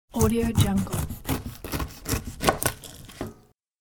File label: cutting fruit by knife